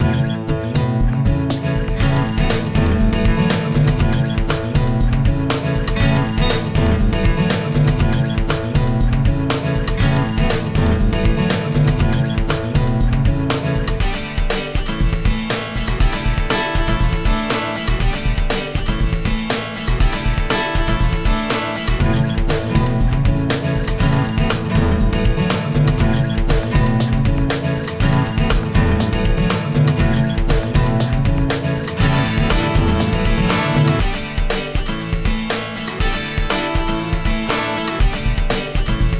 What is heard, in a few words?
Guitar